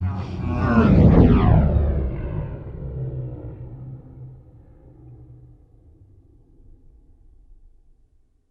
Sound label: Demon Passing